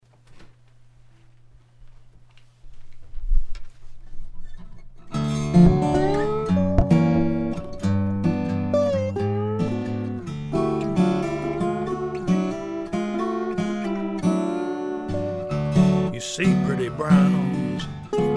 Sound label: Langevin AM-16 four-pack, KM84s and a U87, but I added a dobro part recorded with one of the KM84s about 3″ from bottom of the main resonator.